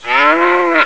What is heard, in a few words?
Cow; Cows; Zelda